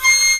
Flute C7

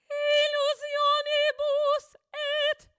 choir

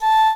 Flute A5

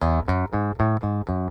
scale low

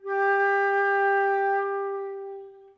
flute G4

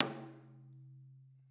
tom

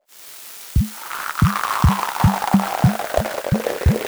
pour

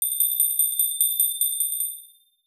tinkle

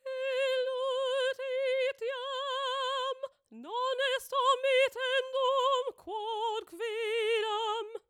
choir

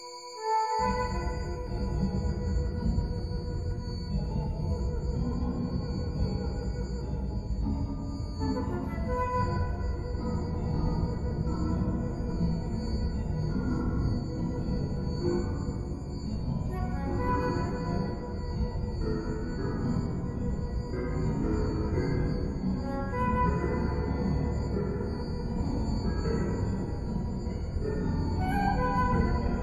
jupiter interpolation playback

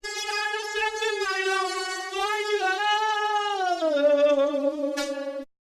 fire

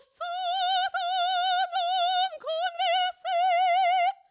choir

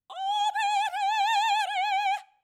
choir

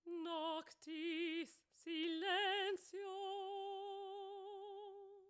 choir

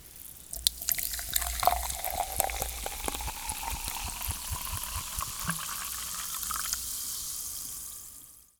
pour real

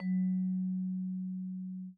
vib

